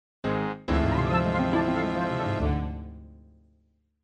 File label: Bad Music; KHVC